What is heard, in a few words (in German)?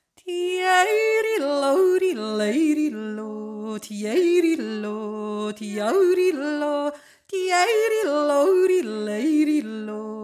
jodldaidai untere stimme